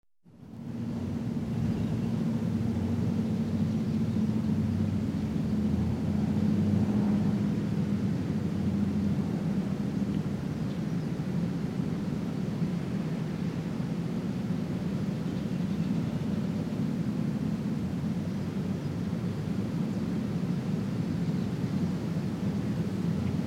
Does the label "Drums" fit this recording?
No